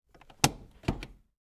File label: hand brake